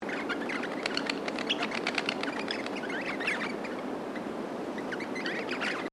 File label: Birds Rosellas Calling, Australian Birds; Birds (Sound Effects:Animals)